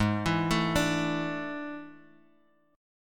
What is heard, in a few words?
A Major 7th Suspended 4th G sharp